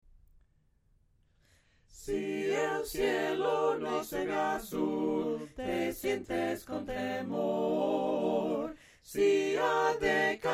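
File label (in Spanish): CANTA Y ALEGRATE HOY SOPRANO CANTADO, himnos a capella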